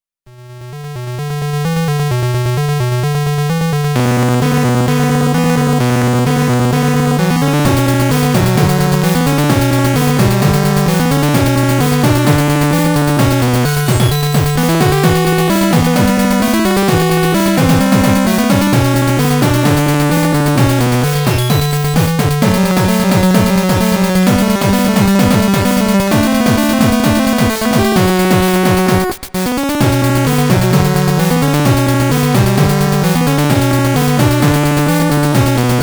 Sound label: bleep blips